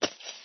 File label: creeper